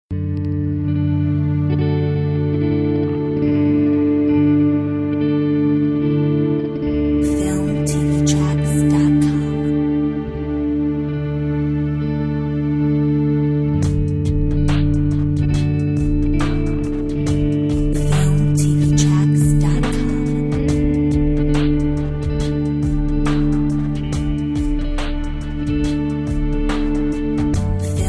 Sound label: Trip hop